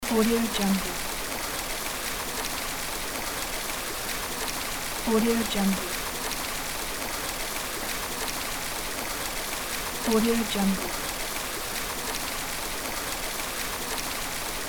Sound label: fountain loop